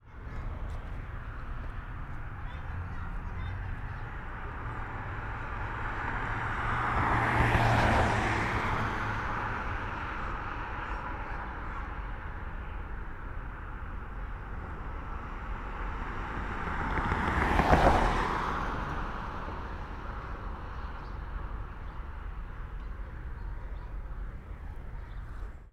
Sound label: Prospect Park Traffic